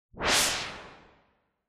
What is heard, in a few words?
Noise Sweep Long